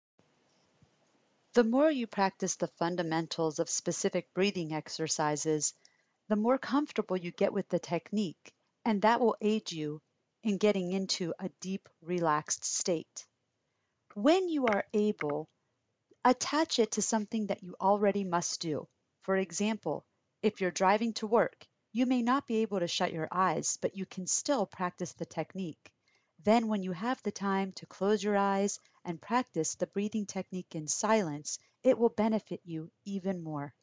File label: Breathing